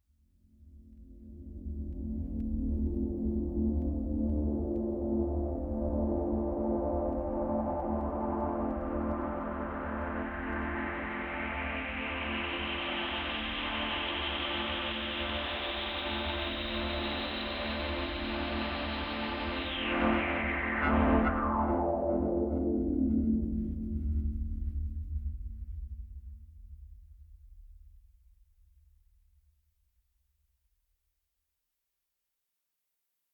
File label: Deep Serious Minded Fade